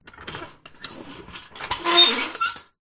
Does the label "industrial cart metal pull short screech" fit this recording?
Yes